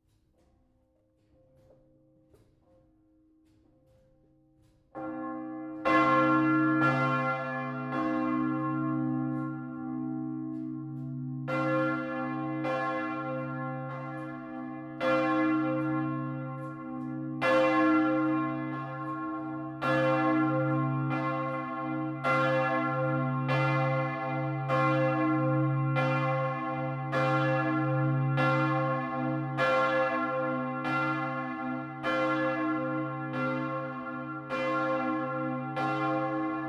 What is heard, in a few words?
chur martinskirche, campanologie